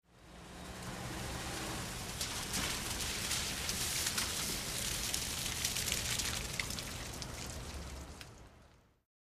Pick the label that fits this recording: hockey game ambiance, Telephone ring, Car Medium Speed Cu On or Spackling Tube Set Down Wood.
Car Medium Speed Cu On